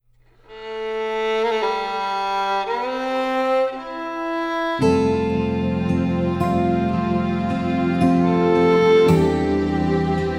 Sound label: Pure Country